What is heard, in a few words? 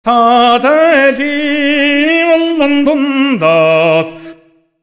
MONGOL V, Asian Traditions; USB Soundscan vol.60; AKAI